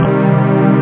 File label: strings